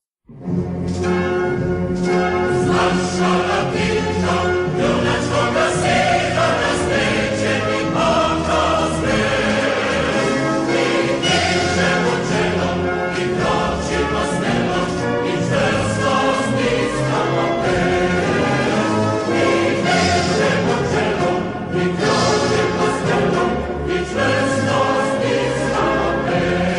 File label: Yugoslav Partisan Anthem English Lyrics; Uz Maršala Tita